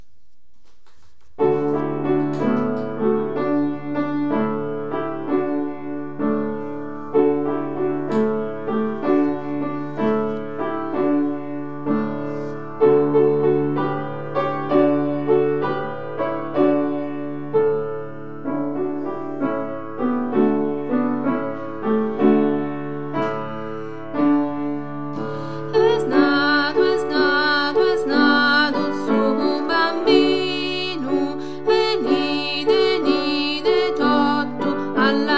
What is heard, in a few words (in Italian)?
Notte De Chelu contralti